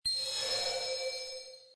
pet, ui; croods

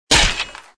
hitted bone; croods

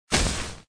monster skill; croods

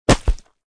bullet hit wall; croods

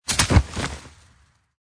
hero drop down; croods; battlespecial

